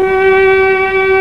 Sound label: CELLO, MELLOTRON; East West - Global Sounds